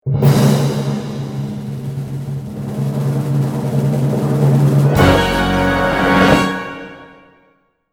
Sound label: Heroic Drum Cheerful Sample; pantomime backing tracks